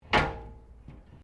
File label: Door Pivot Interior Glass Cabinet Misaligned Open; Various doors